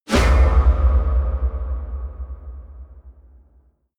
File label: dark scary metal impact; hits explosionen